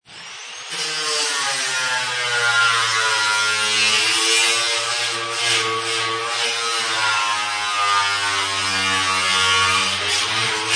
Disc Sander On Metal, Disc sander working on solid metal, with starting and ending noises; Sander Sound Effects